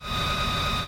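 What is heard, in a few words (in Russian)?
скрип станка, сверлящего кафель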